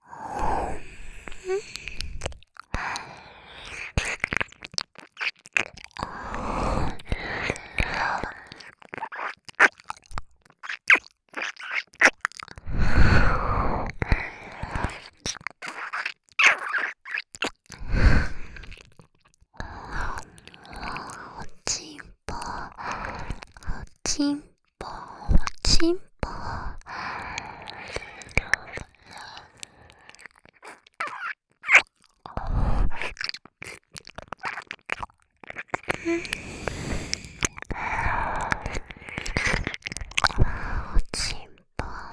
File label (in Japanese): 耳舐め; お屋敷メイドのハーレム淫語ヘヴン 気が済むまで耳舐め淫語を堪能できるバイノーラルオナサポ音声; 右差分